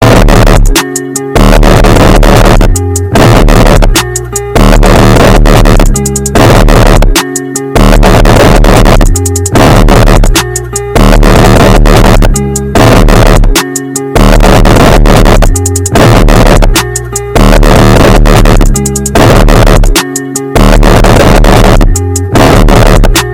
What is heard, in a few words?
bass ringtone